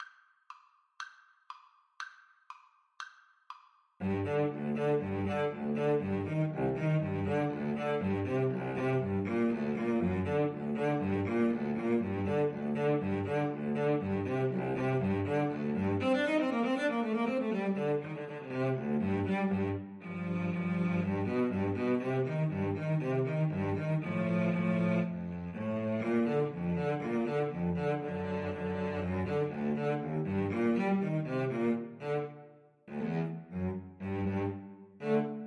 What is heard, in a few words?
Cello Duet